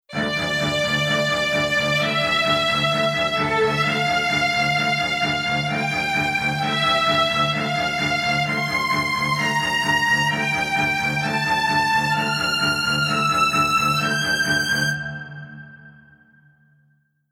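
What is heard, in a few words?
Strings Homophony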